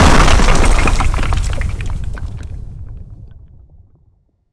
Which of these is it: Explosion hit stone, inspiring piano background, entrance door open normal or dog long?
Explosion hit stone